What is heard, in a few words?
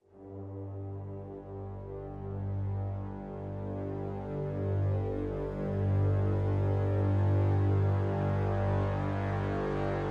Brass Swell Lo (G); CRES